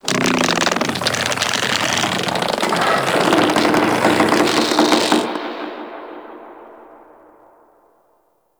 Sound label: Infested Golem Wings Open; Warframe